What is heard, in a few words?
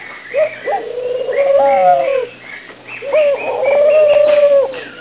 doves; Sound Effects for Multimedia - Volume 1; birds